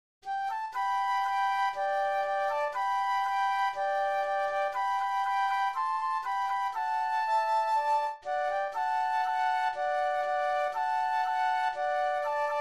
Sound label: Flute Duet; Polly Wolly Doodle